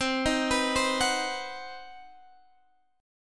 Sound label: C Minor Major 7th Flat 5th C down; arpeggiated; CmM7b5